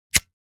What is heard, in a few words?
Bic lighter flicking attempting to light